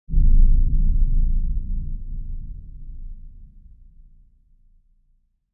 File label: Music Eerie Low Sub